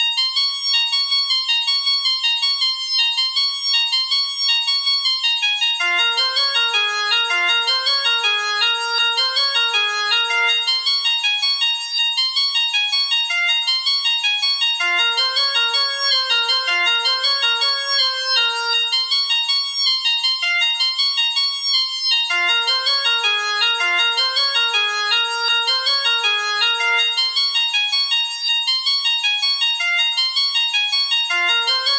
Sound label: Laridé